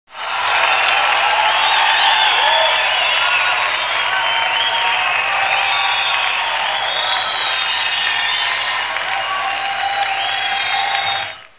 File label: Large Crowd Cheering; How Many Bugs in a Box